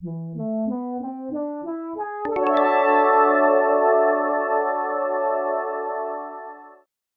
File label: jingle of glory; minius maximus ost